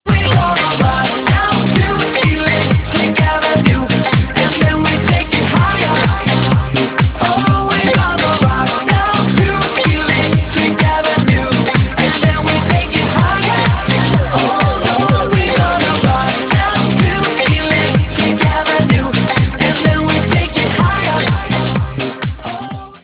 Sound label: velvet rock; down to e